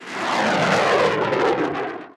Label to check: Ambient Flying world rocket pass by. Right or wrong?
Right